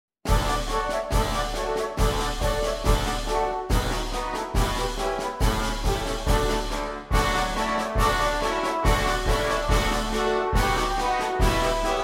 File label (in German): Marsch